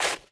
snow; The Sum of All Fears

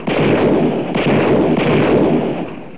RIFLE SHOT, Military Sounds; MineSweeper v3

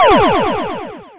smart bottle; Ultimate Games No. 2; dr strange

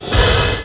menu; X-men

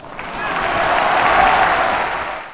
Rock Applause

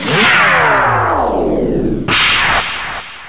Time Machine Stop; Loony Labyrinth

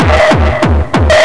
Gabba; Guitar & Electro

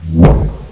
GOOD object drag; PUZMANIA

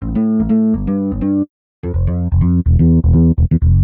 SNTH BASS, Bass; eJay

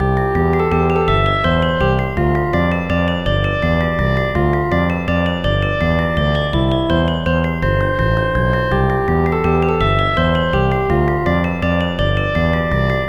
RIDE; ThemePark Demo

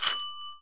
Bel; FRACTIONS PIZZA

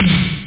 bass snare; drumkit; OctaMED SoundStudio V1

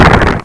boom; Glypha III

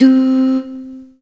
C3 OOHS

